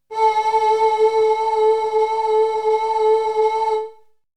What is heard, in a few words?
Synth; ZERO G - Datafile DISC 1